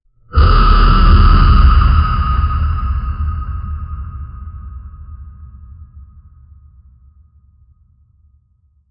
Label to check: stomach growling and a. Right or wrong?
Right